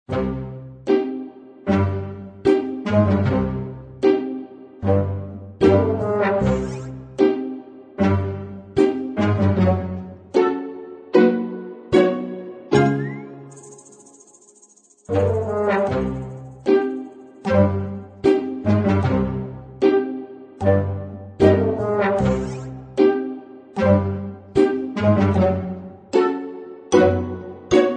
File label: Comedy Fun loop